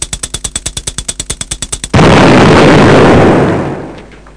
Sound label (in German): zeitbombe, comic samples